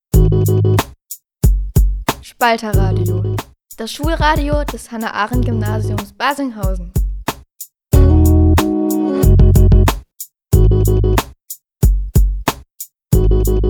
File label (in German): Jingle Intro, Schulradio; Spalterradio